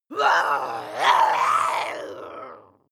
witch scream aggressive

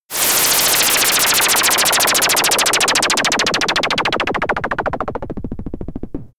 synthetic spin

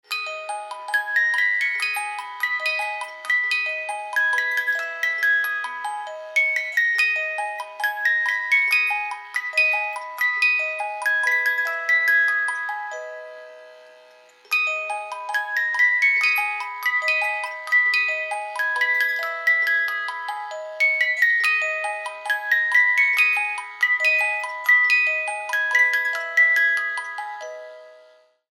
music box; swan lake

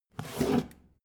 take book hard

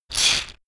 shaker wood rough hit single hard

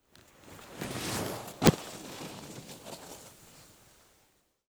skiing with jump

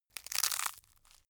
crunchy bright

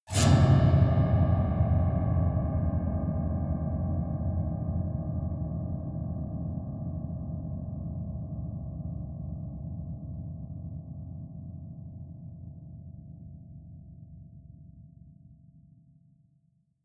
piano twang multiple fast dull

